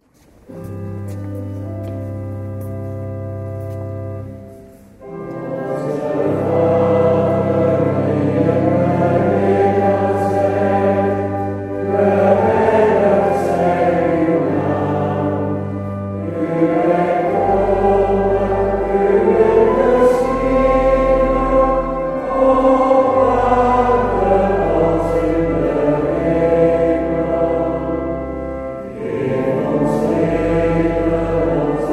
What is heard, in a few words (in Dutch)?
Onze Vader; 140420 PaaszondagBR